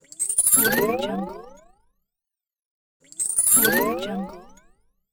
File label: robot power up